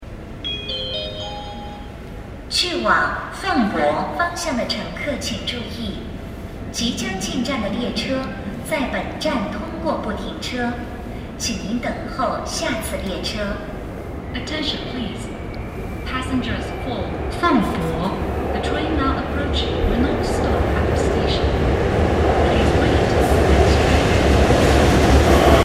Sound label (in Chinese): 15号线 俸伯方向 通过不停车